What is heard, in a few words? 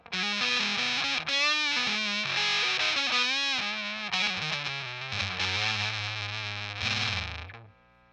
neck lead